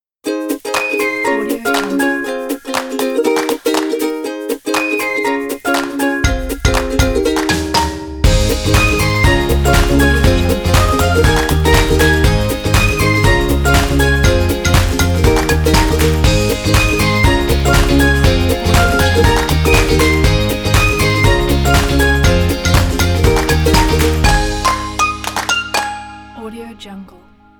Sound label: upbeat happy kids logo